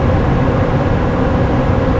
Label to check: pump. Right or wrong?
Right